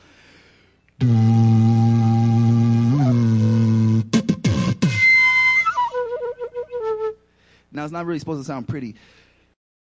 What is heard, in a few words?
buzz not pretty, beatbox flute; remixable collections